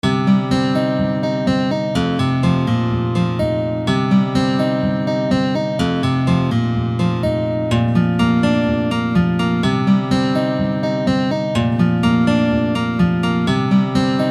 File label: riff